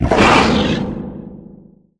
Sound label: leap; weapons; dota; mirana